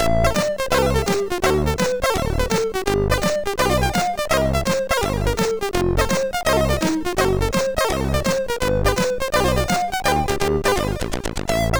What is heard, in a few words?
Jazz, sid MOS6581R2